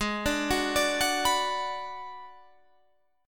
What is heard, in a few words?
B Minor 6th G sharp